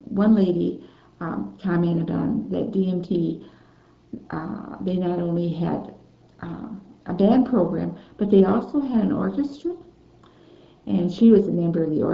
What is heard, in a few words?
orchestra